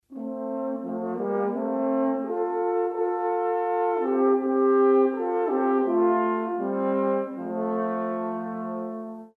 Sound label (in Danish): Horn, Trompet; Messingblæserne